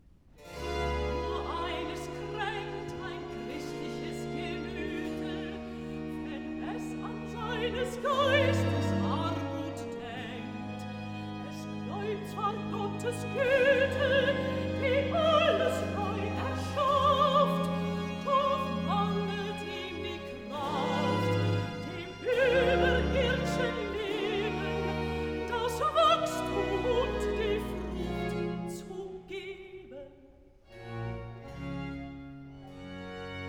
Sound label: Recitativo (Alto), VOCAL; BWV075; Nur eines krдnkt; BACH